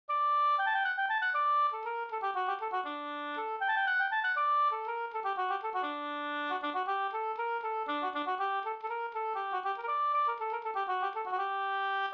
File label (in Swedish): polska Bingsjopolska Karbolepolskan